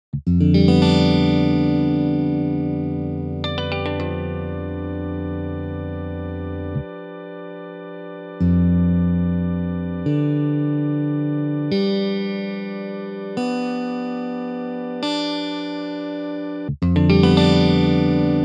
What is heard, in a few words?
Crowd xFDGBD; crowdsourced tunings; ragajunglism